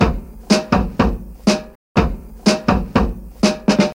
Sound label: 122 bpm drum groove b key, Free drum loop sample - kick tuned to the B note. Loudest frequency: 1048Hz